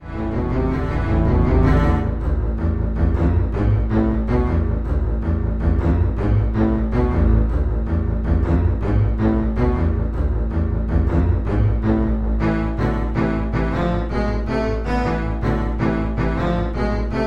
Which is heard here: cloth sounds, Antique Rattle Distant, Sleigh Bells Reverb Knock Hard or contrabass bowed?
contrabass bowed